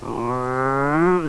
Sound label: zombie alert; svencoop; biglolly coop